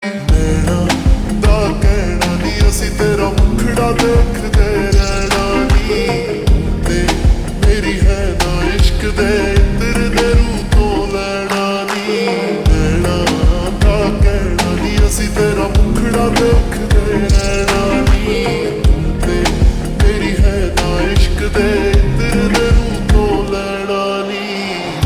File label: Ringtone Slowed Reverb